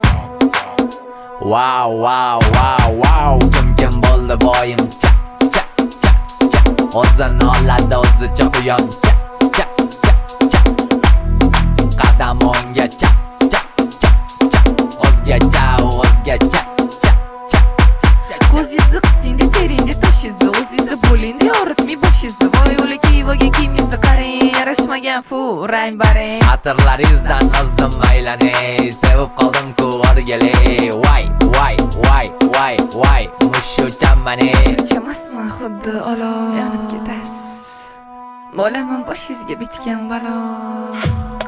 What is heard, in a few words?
cha cha